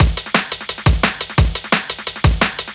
DNB BREAK

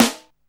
Snare, Alesis Hr16, Drums

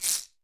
Lo Torpedo, Alesis D4 Drum Machines

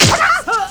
70s Hit; Drums; Odd Bitz and Hits

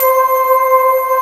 Chime Voice C4; Digital Sound Factory Virtuoso

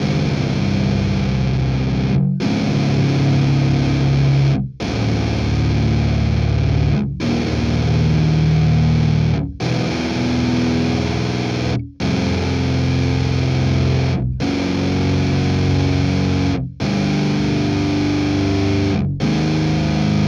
chords, M8 Metal

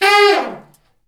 BRS SH FAL, Section FX Fat Falls; Roland L-CDX-03

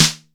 snare classic

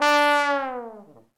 THE TROMBONE

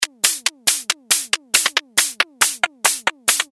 Loop Hat, Drums; leloops

